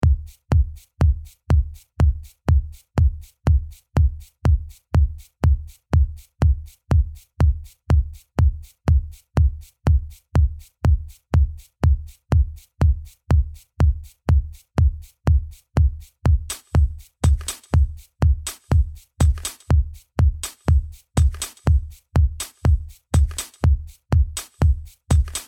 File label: Saxy Beat, lifted house